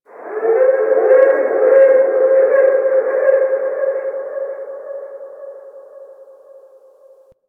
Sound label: Ocean Varous Creatures; Warframe; Grineer Ocean